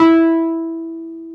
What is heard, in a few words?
PNO, Wurly